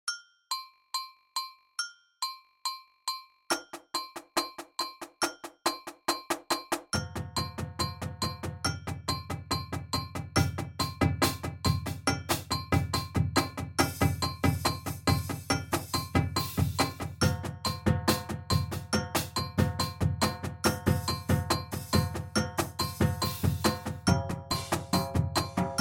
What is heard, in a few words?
140bpm, drumline